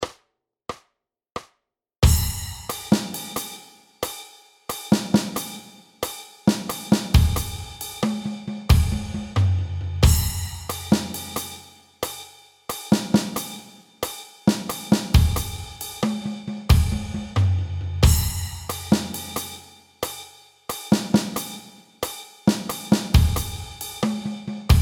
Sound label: swing Drum Warm Up; Intermediate Drum Lesson